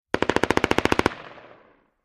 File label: M-16 Short Barrel Machine Gun Burst From Distant Point of View